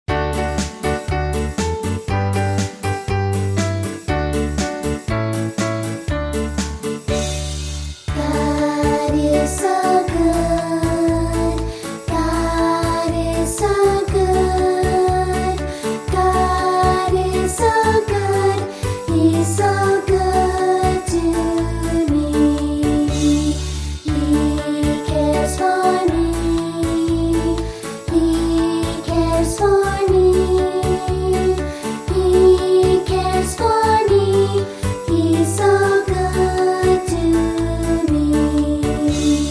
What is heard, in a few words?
Childrens Choir